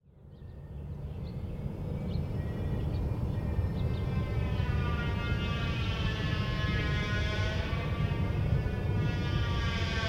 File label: Scary Saw Thing